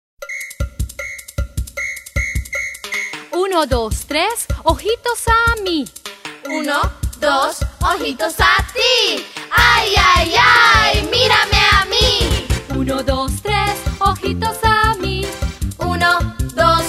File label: Spanish 1 2 3 Latin